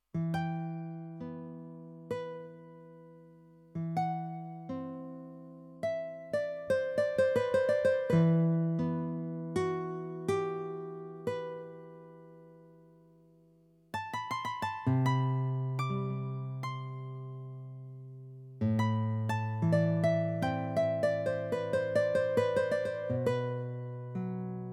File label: Guitar